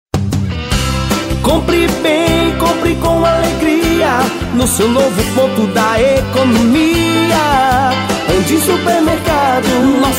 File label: JINGLE; ANDIM SUPERMERCADO